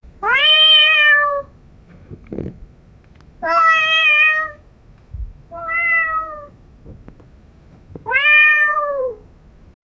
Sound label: happy cat, purr